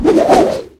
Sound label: fire, smallclaw combat abilities